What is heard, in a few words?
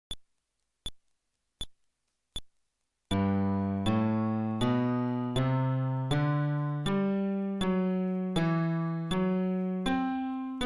Scale テンポ80, fretless bass